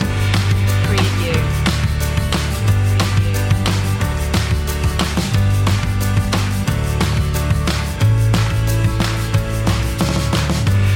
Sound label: indie feelgood loop